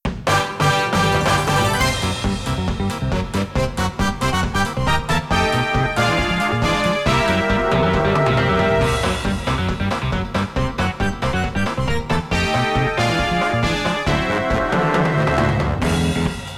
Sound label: Fire; Kirby Air Ride; Top Ride